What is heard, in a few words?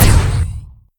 Lasgun Fire